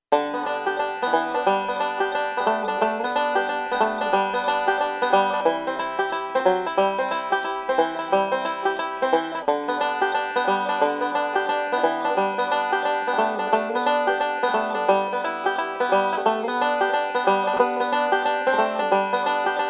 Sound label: do lord forward rev roll